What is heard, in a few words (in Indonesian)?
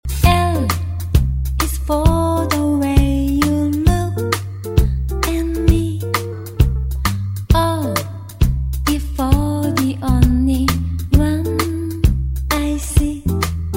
Nada Dering Romance Love